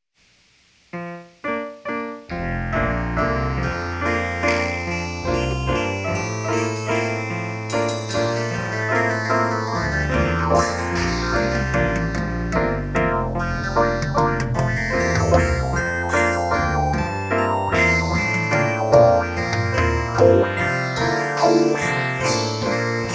computer variations

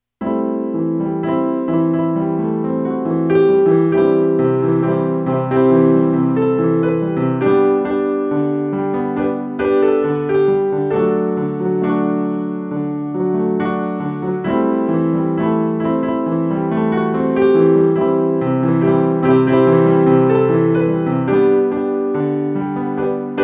piano short

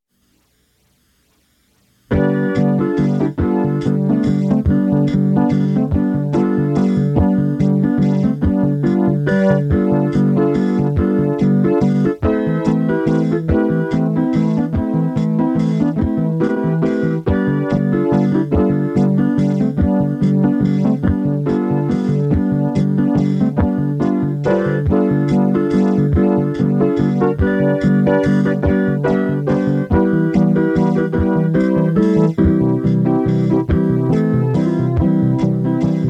chorus

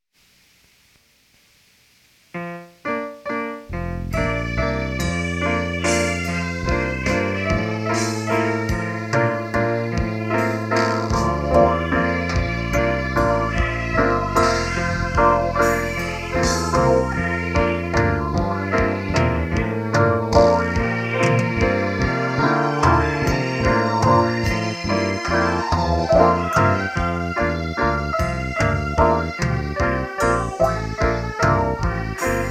computer variations

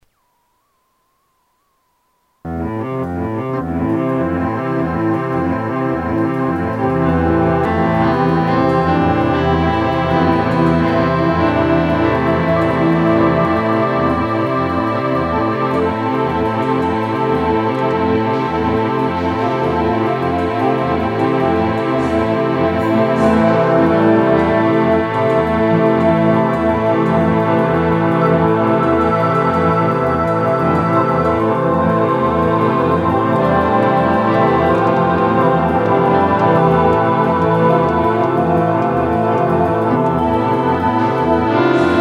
LowFid

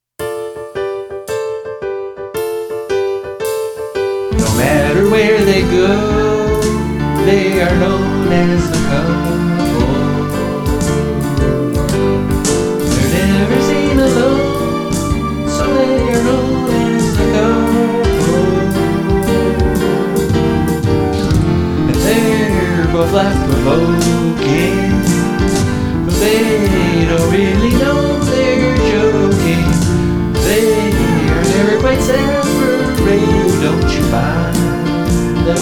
theme song sing

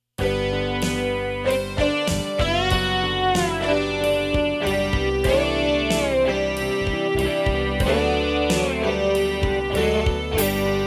chorus piece

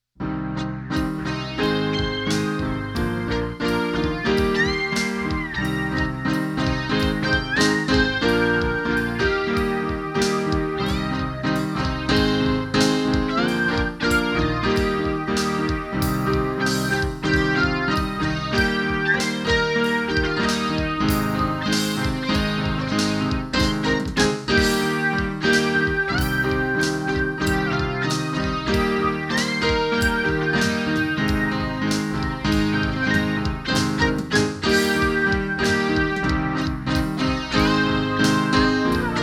inst

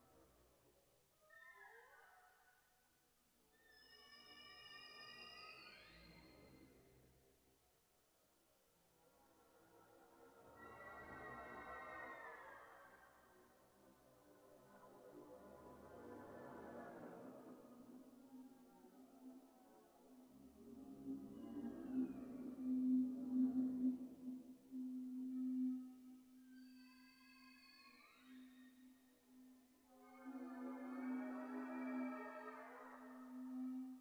horror chorus cavern of headless spirits; juno g

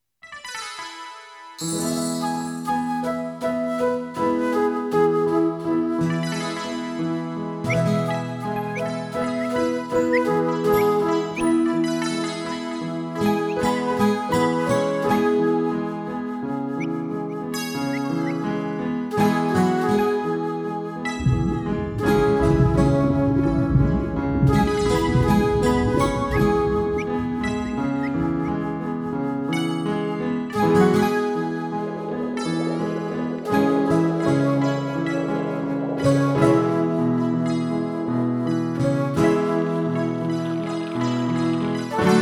inst sound effects

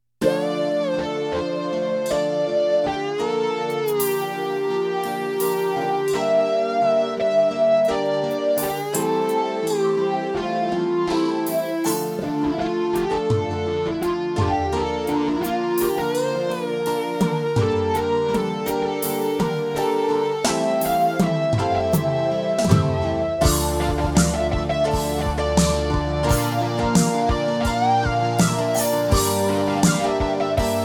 autowah

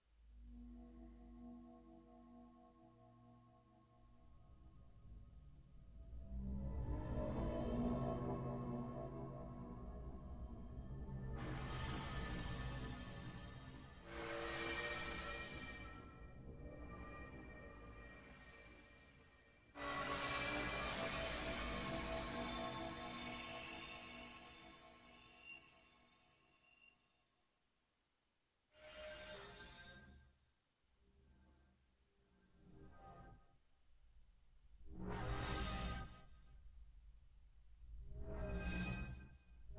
horror chorus cavern of black crystals; juno g

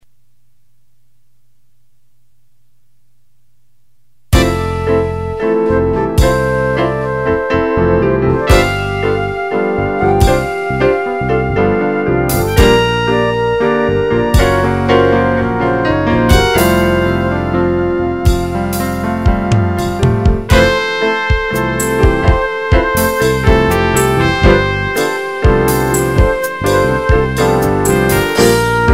with flute

